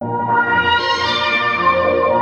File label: ORCHFX, orchestra, Asian Traditions